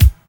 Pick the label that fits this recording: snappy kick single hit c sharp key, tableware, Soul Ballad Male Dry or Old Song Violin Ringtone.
snappy kick single hit c sharp key